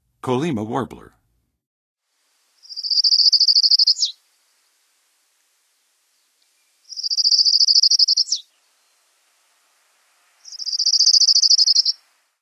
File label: Colima Warbler, Songs of Birds of North America; Western USA; Western USA Songbirds